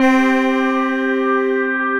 Graceful Keys; Broken Keys

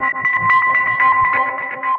Marble Rhythms, Percussive; Ableton; Factory Packs; Punch and Tilt

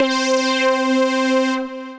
Slick Brass Pad, Synth Lead; Ableton; Factory Packs; Build and Drop

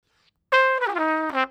Trad Jazz Trumpet Intro, Brass

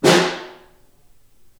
Far, Snare

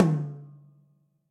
Tom High, drums; Warm And Low; Drum Hits; Ableton; Factory Packs; Session Drums Club; MPE Hits

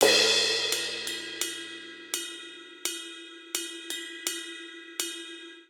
Straight Eighths Rock Cymbals Only 84 bpm; Ableton; Factory Packs; Drum Booth

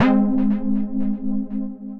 Pathos Key, Synth Lead; Ernen; Ableton; Factory Packs; Build and Drop

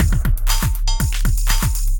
Kindified Kit, Drums; Kindified Kits

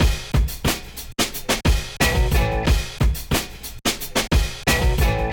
kit drum; Drum On Son; Ableton; Breakbeats by KutMasta Kurt

